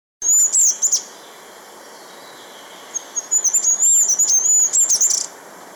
wintergoldhähnchen; Mag. Peter Holub

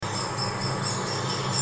kleinspecht; Mag. Peter Holub

